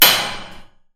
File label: hit